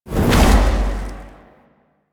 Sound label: Void Dragon Orb Return; Duviri; Abilities